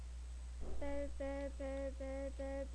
bell

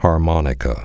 harmonica

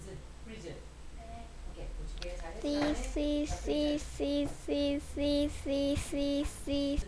sea

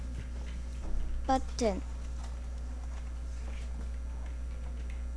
button

